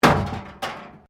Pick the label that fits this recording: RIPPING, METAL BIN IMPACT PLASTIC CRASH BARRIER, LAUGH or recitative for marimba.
METAL BIN IMPACT PLASTIC CRASH BARRIER